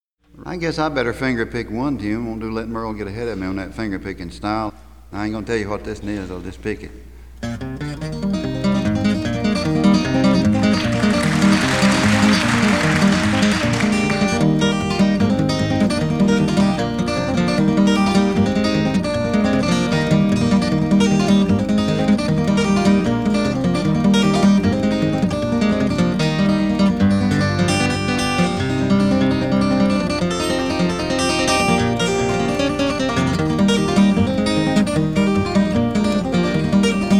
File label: Guitar (Instrumental)